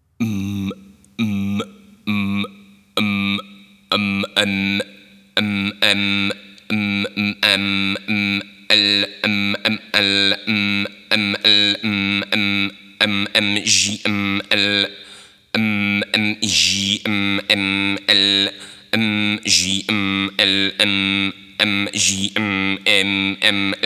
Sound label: A morse